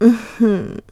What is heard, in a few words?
Stylish Woman Mmm Hmm